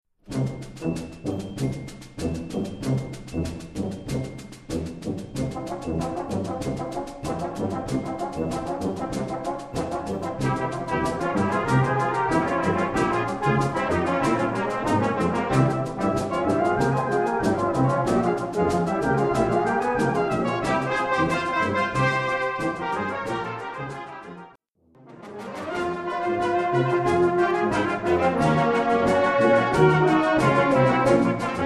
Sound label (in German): volkanic orchester